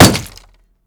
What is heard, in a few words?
shield light block small